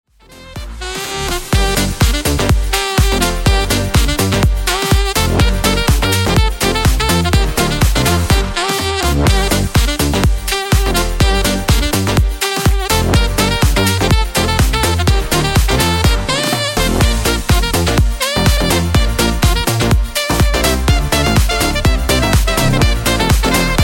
synthetic sax

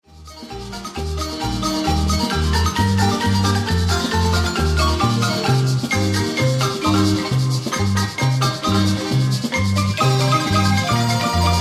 marimba

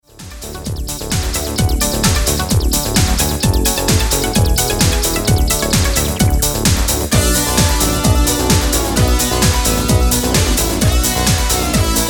strings

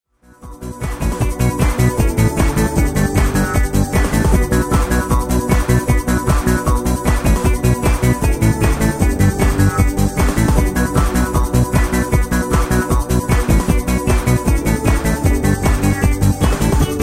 trance, song

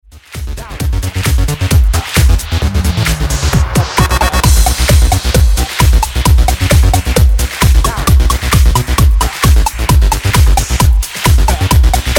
disco club

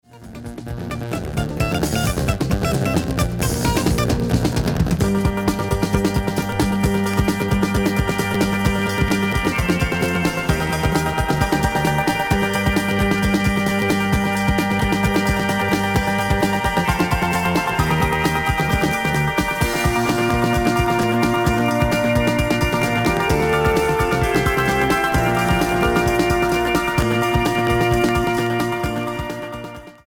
music sweepers

